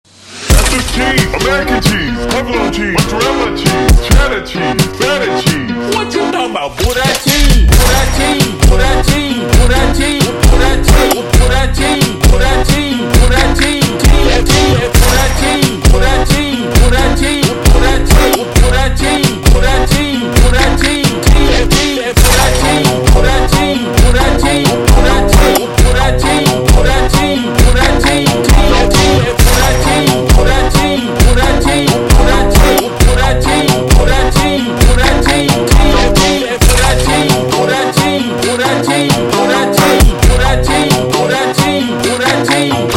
Brega ultra Slowed; funk

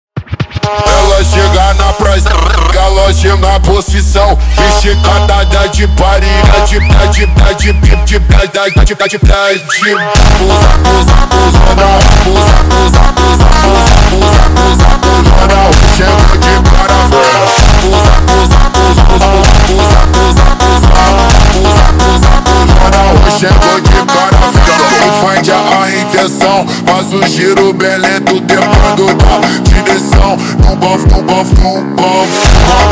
funk slowed

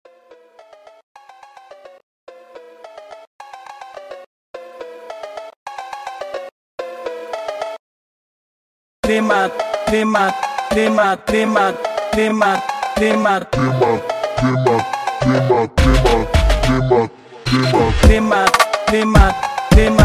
Funk ultra Slowed

